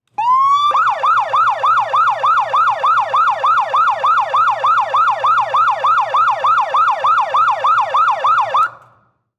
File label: Auto Motorcycle Siren Engine Cooling; Transportation